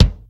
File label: BEAT KIK, JAZZ; Hollywood Edge; The Big Bad Beatmachine